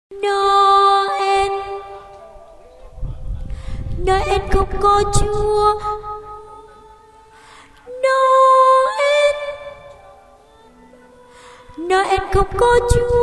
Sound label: tenor